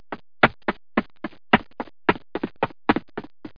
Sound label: step